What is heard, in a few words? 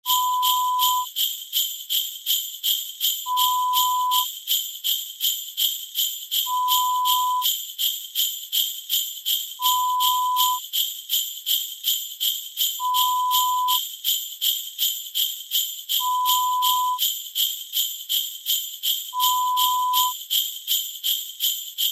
Sleigh bell